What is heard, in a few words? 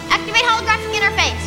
Interface